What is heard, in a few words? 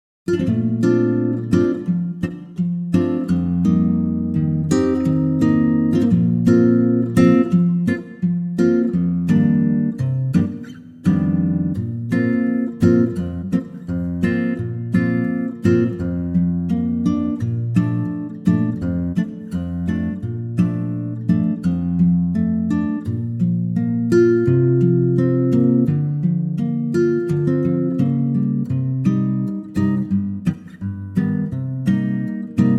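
Bb Acoustic Guitar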